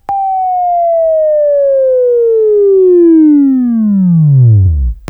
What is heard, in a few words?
WAV Right, Sweeping; Sweeping Training program